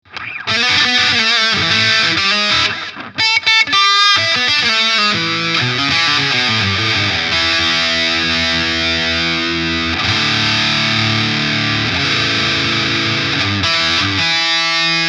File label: Cali MkIV full gain